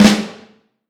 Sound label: Vintage Snare Drum; 5.5X14; Q Up Arts; Johnny.C's Vintage Snare Drum